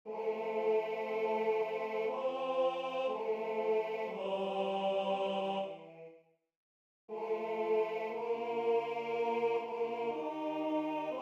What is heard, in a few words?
Tenore; repertorio polifonico